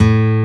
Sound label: Acoustic Gtr A1; Proteus 2000; Digital Sound Factory Proteus 2000